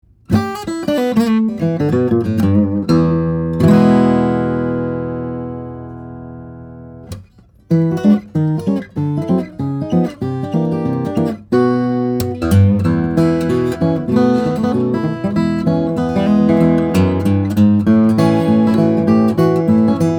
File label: Martin 00 18, steel string guitars flattop; 1942; martin 000-18; mahogany adirondack spruce